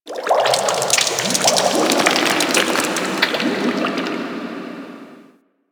Ocean Cave Crack Small; Ambience; Warframe; Grineer Ocean

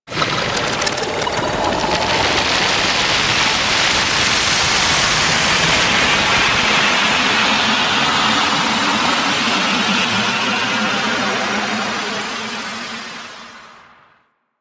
Orbiter To Lair SFX Transference Switch; Warframe; The War Within

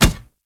Kubrow Run Metal, Footsteps; Enemies; Warframe

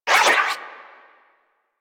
Universe Ring Charge Pre Cast; Warframe; Warframes; Nezha

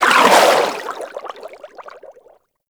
melee whoosh; Warframe; DE; Vehicles; Sharkwing

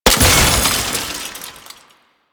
Belt Fed Rifle Last Shot; Weapons; Warframe; Tenno; Frumentarius

